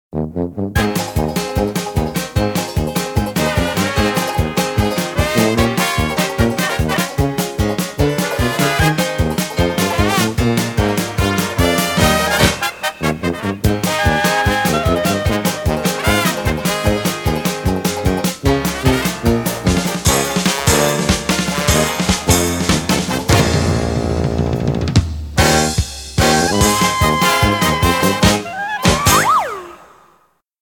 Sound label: start bgm; PingPongPro